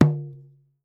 African CLAY, African percussion; Voice Crystal - Steve Reid Definitive Percussion

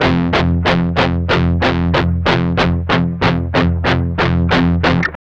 MED ROCK 8TH F#2, Guitars; Spectrasonics; Hans Zimmer Guitars VOL-1; 102-MED ROCK

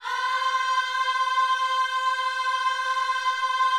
OHS C 5A, Gospel Ohs; Spectrasonics; Vocal Planet

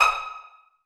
STR PIZZ D#4 MF; Sound On Sound - Peter Siedleczek's Orchestra

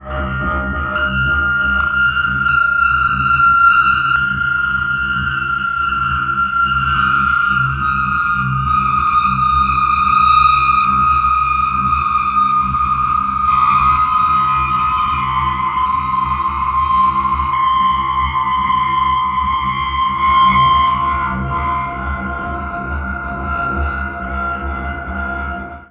texture agony C Eb F, One Shot Textures; Transmission-X

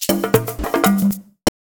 SHAKER, Modern Percussion Loops; Soundscan vol.56; AKAI; SHAKER133

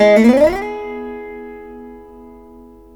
VEENA; Spectrasonics; Heart of Asia

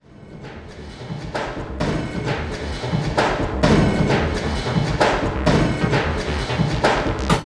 F IN, Tekno Industrial; East West

